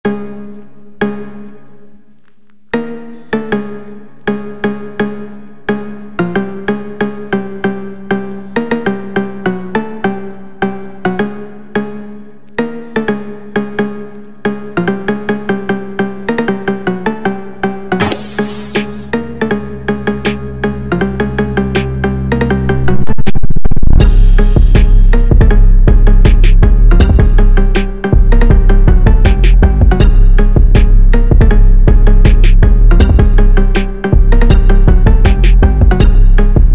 Phonk feat Phonk, Drift Phonk

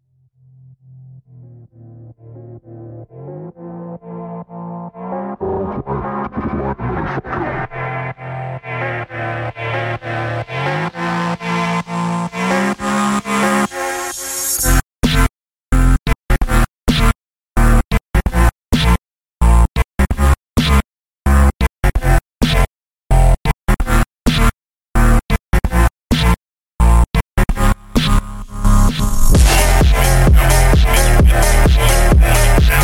Phonk

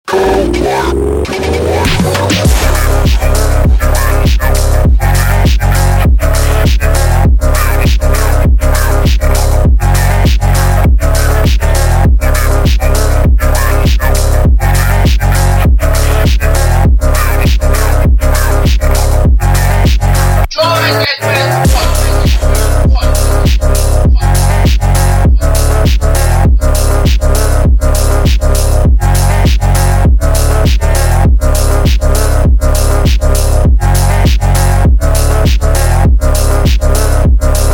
Phonk

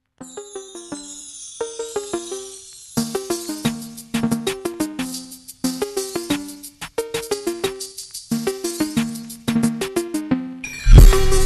Phonk Drift New Year phonk Remix

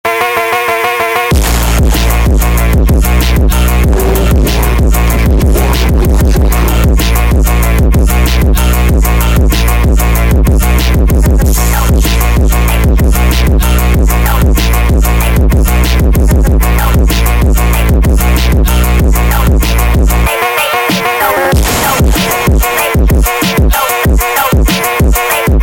Phonk

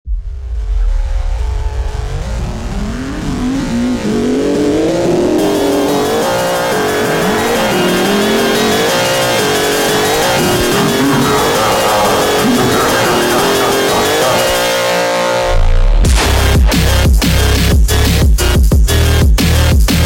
Phonk interlude

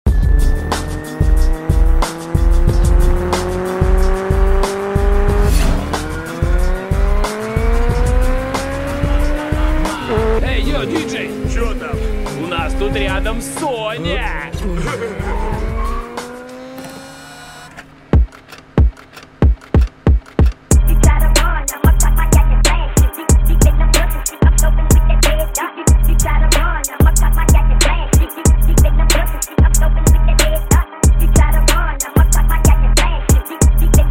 Drift Phonk

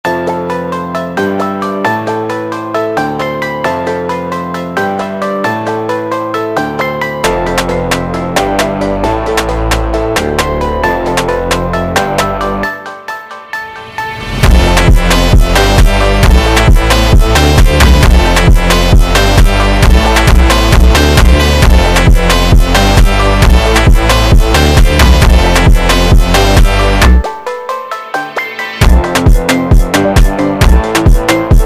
instrumental Sped Up

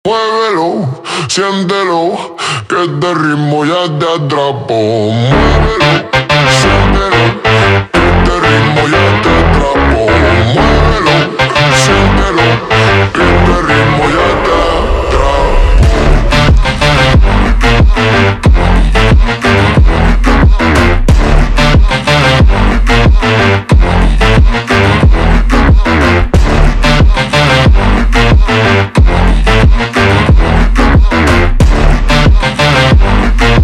ultra Slowed, phonk

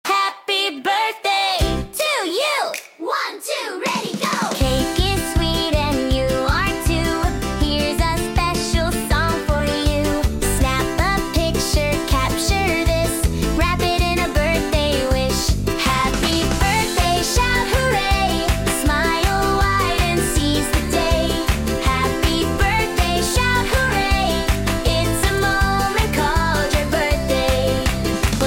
Happy Birthday Song

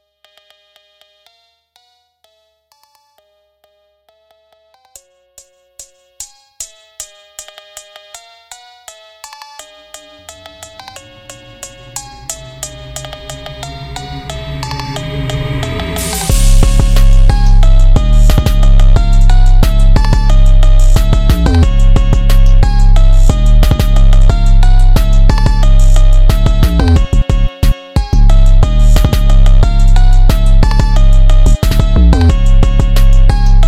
Drift Phonk Mix

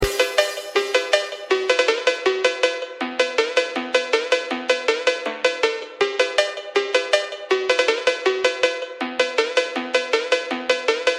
Phonky; ringtone funk; آهنگ زنگ فانک

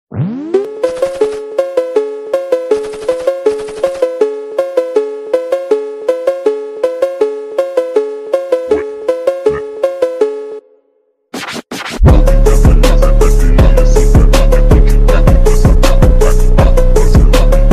Phonk; ringtone funk; آهنگ زنگ فانک

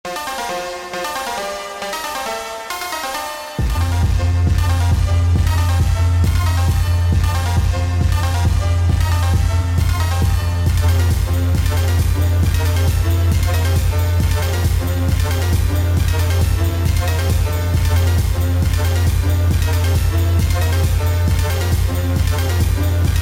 Christmas Phonk house Spedup Remix

